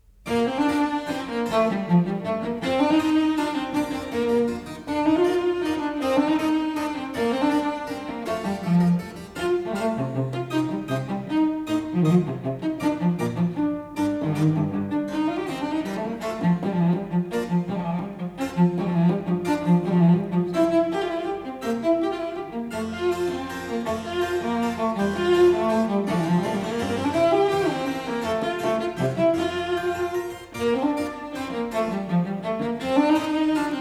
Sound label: Allegro; Vivaldi